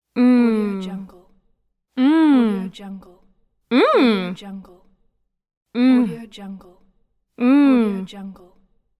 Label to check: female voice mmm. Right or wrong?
Right